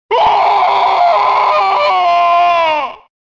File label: male scream; deadly alliance